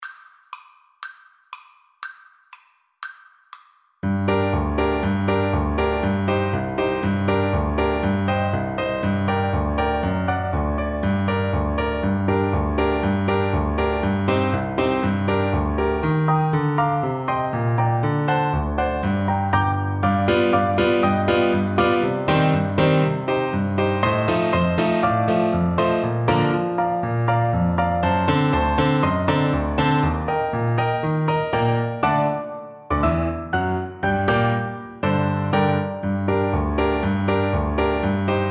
hungarian dance BN, Bassoon

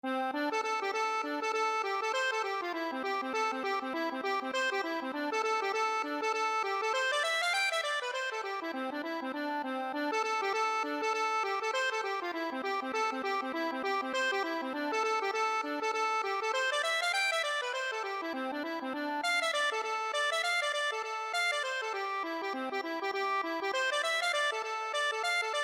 reel, accordion; cooleys

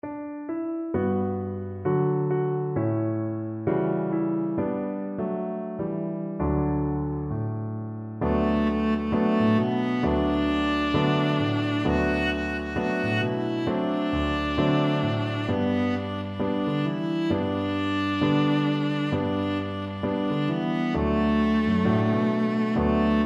this little light, viola

